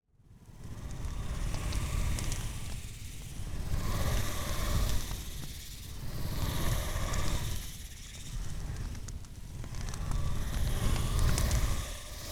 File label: Wisp Fire